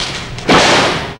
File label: BANGS